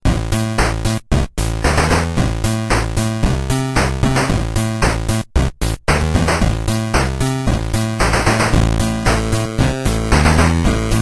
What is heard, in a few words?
8bit; Absolutely Unstoppable MineRun; Wii U